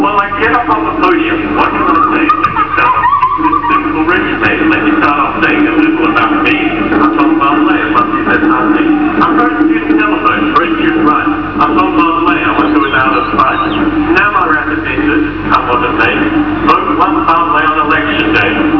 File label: election rap